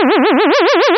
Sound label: vibrato step synthesized; dafx18